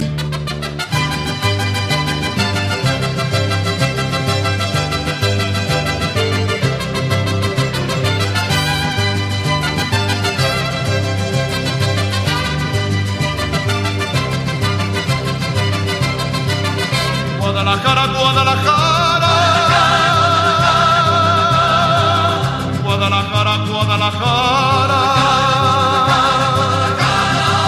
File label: Mariachi